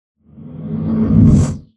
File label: riser; sonniss gdc